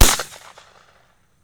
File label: SHOT; Deadly3D; E-MU Producer Series Vol. 5 – 3-D Audio Collection; 3D Percussives